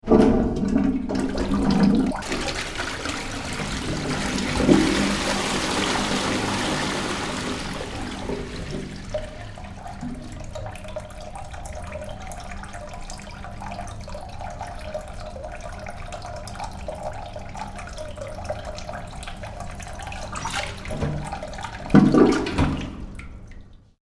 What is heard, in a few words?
Toilet Flush in Prison Cell - Flushing and filling; Tom Hutchings